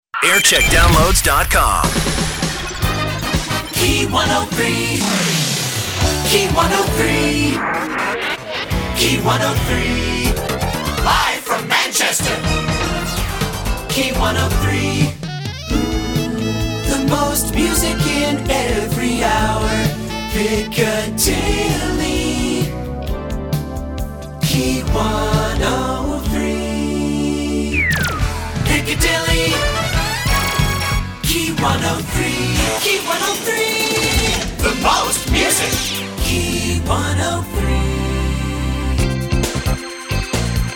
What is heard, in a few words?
jingle montage; key 103